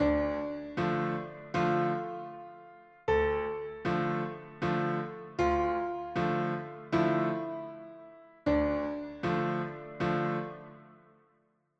more severe clipped, music

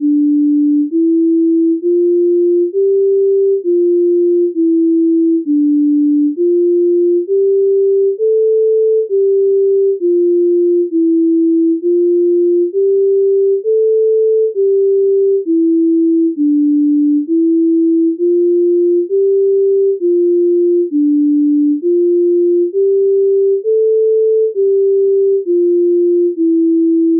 hymn flute; hurrian

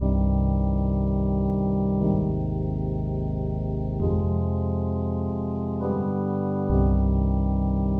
judicial cadence weight

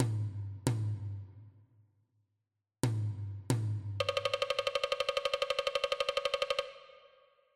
filter snaps shut drum cymbal faster

